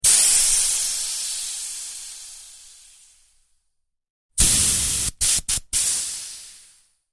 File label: Bicycle Tire Long Air